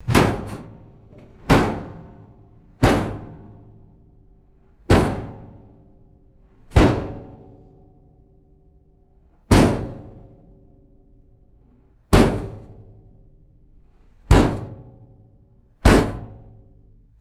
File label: hollow metallic hits on a vending machine; machines